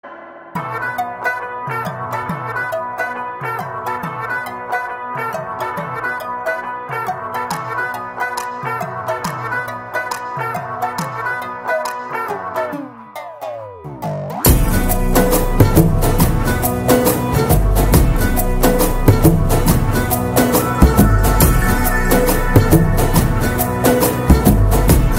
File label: drums bgm; Bheemla Nayak; Telugu